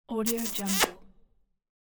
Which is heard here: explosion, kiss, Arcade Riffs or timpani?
kiss